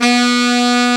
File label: SAX, REED